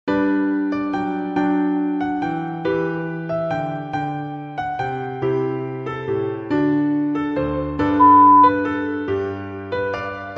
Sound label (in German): Klassik Klavier